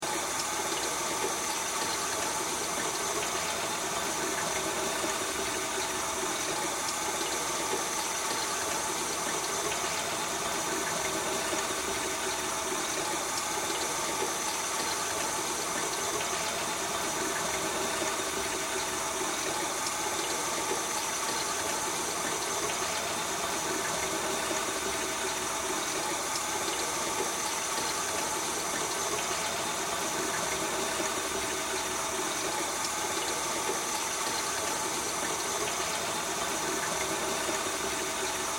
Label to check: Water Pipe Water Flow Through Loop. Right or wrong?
Right